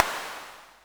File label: Perc Hit, Noise